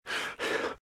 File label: Breathe Fast Heavy; RadwinIsland